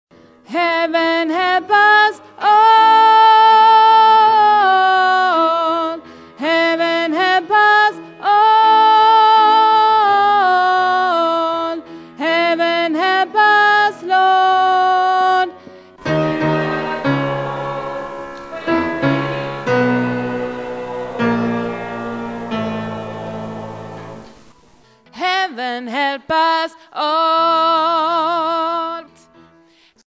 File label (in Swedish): bas